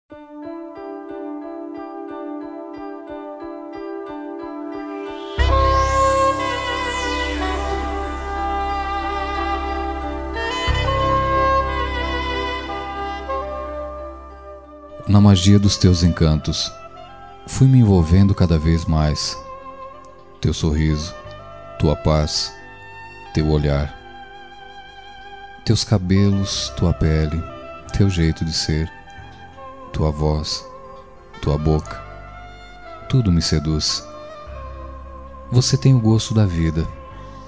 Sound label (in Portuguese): Sensual masculino